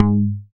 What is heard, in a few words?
BAS MKS-50, Roland